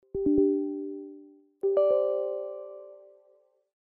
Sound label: Windows user account sounds